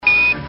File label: Space Beep, Communications beep; NASA